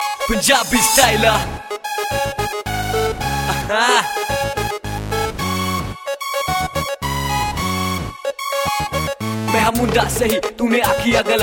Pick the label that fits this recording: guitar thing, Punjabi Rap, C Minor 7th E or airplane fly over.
Punjabi Rap